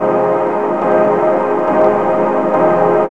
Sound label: SYN LOP